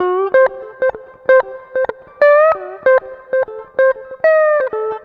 RHYTHM TWANG; Bass; Sonic Foundry (Sony Creative Software); Not Just Another Pretty Bass

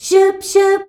SCHUBSC G, FEMALES

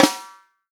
snare drum, HIPHOP SNARE CHROMATIC; Best Service ProSamples vol.43; Real Drum Kits

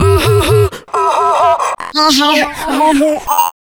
VOICE FX, Dance Vocals

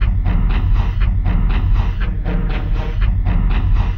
Elektro Flange, drums; Sonic Foundry (Sony Creative Software); Friendly Fire

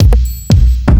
4 floor beat, trance

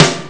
snare piccolo; Amiga Soundtracker Sample Packs

